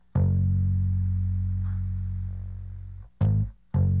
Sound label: bass; musdb18